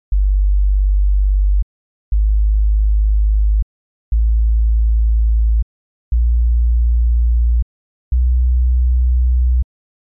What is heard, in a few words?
2 octave walkup; hometracked; simple ways to test your rooms frequency response